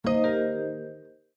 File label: Cityscape Notify; Windows 7